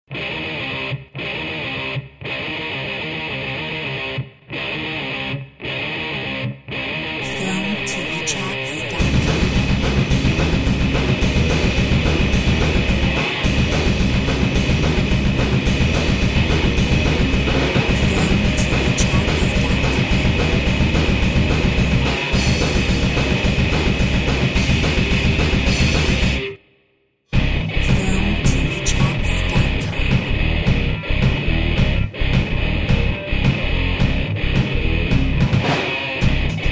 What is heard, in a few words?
Metal Drive; Filmtv-tracks